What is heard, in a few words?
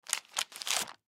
Money Bill Stack, Money Bill Stack Remove Single Bill Dollar; Foley; Objects; Blastwave